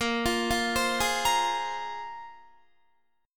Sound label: A sharp 7th Suspended 2nd, Guitar; A#7sus2; arpeggiated; 17,20,17,17,20,18